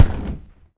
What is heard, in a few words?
jump landing; qwokgames; tanew